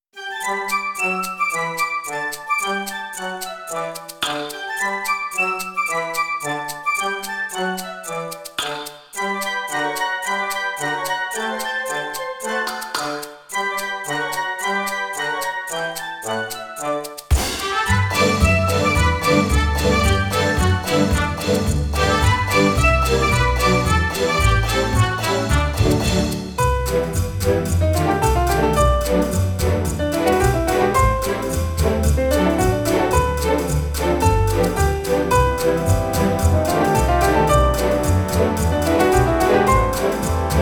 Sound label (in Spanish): flautin